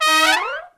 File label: SECT BRASS FX